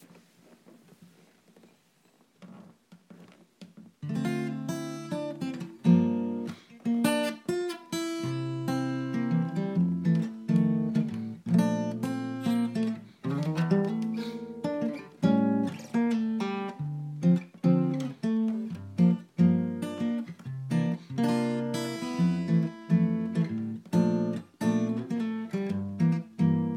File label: fingerstyle guitar